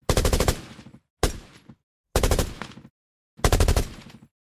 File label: Browning M1919 machine gun burst